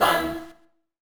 pan; HeavenStudio; bon Odori

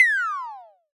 fall; HeavenStudio; nightWalkAgb

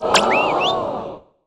success; first Contact; citrus Remix

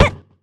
catch; en; HeavenStudio; pajama Party

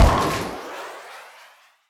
rocket bowling; HeavenStudio; launch Party

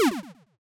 Pause Quit; HeavenStudio

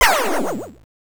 miss Neutral; HeavenStudio; space Soccer

